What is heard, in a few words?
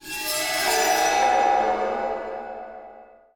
horror title